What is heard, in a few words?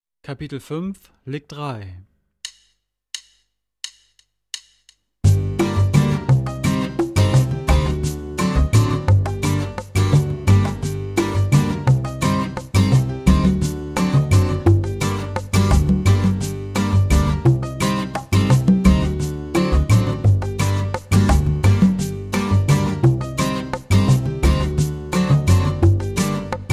Latin Style Guitar